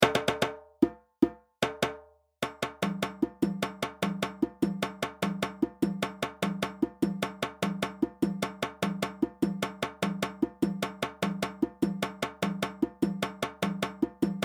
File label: Kenkeni + Djembe; Djembe 1; Sorsonet